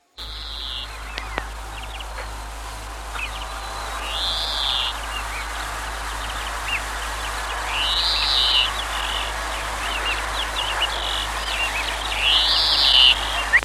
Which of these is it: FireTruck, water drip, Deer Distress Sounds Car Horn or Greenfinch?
Greenfinch